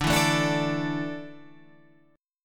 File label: D Suspended 2nd D, down, Guitar Half Step Down